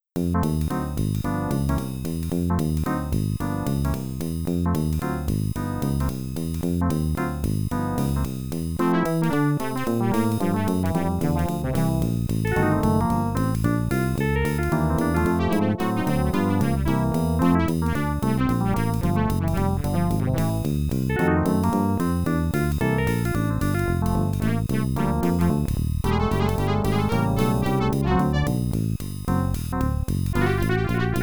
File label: Hold That Train IBM-PC AdLib; Transport Tycoon